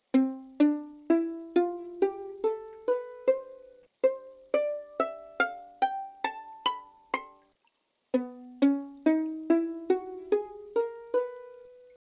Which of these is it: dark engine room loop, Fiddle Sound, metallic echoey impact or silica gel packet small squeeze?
Fiddle Sound